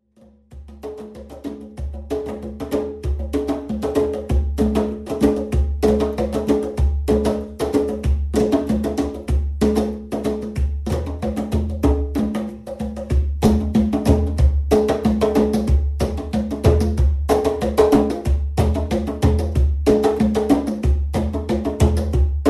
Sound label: 2 drums bougarabou